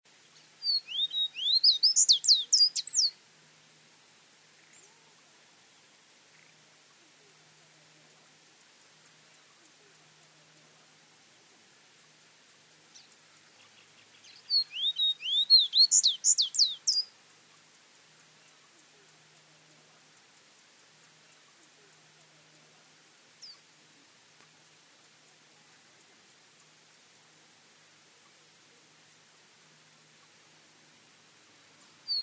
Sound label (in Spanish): capuchino canela, Sporophila minuta, Tawny-bellied seedeater; cantos